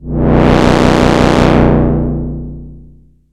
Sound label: bass, techno acid